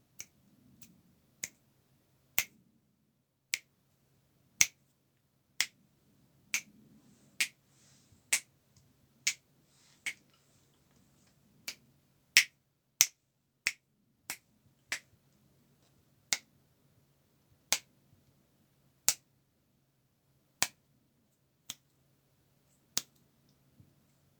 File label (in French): bruitages percussifs chambre